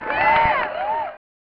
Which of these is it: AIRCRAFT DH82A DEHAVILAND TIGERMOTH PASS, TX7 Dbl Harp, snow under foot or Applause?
Applause